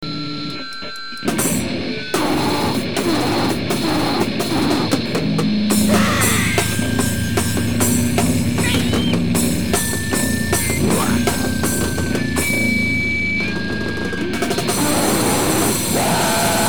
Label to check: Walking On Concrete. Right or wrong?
Wrong